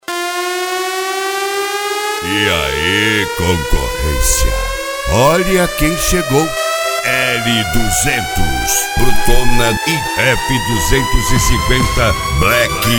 abertura som automotivo

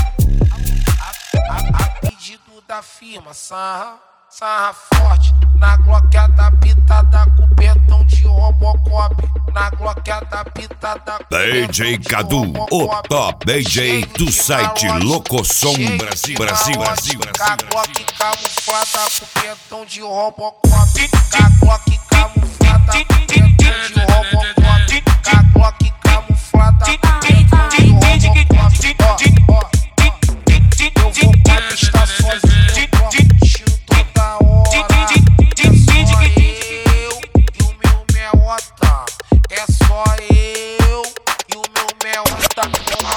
mega funk; set mega funk vol2; especial mega funk vol2 agosto

